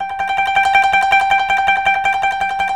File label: KOTO TREM